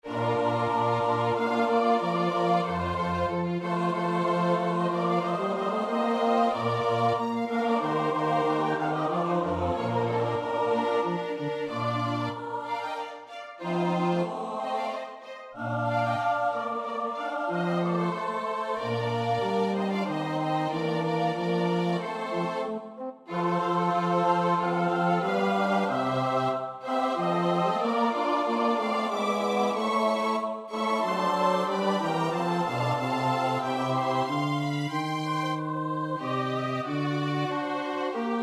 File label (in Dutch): S-A-T-B, koorpartij oefening; Mozart (Wolfgang Amadeus); Litaniae Lauretanae, KV 109